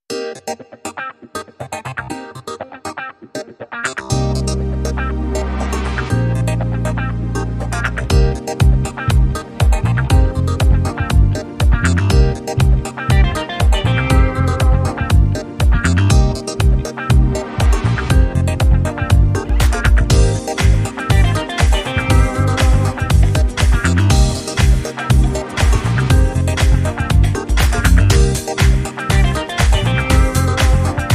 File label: Funky Box